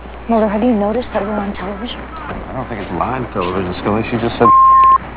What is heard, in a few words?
beep